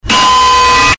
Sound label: Distorted Anvil Falling; Minecraft